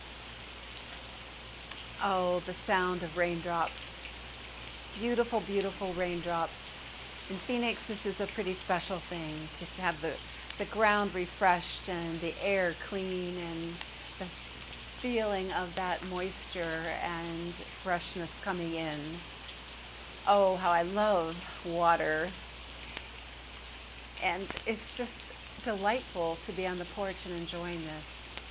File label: beautiful rain